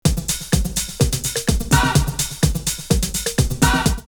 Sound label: Bouncing 126, drumloops, Rave; X-Static Goldmine; 90 s Sample CDs